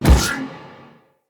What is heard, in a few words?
Iron Eruption Blast Cast; Warframes